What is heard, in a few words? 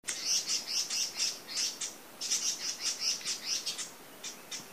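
sosicho, Ashy minivet; bird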